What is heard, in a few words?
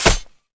Hit; Smite; god Izanami